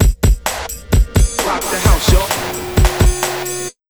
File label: HARD 130, Big Beat Drumloops; USB Soundscan vol.25; AKAI